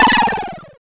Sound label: Hurt